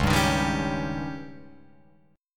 C sharp Suspended 2nd Flat 5th C sharp Guitar Open F; strummed; JGuitar